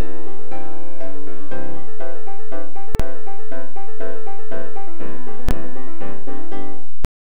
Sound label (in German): Klavier